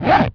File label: zipper; BESTSHOT; noisybit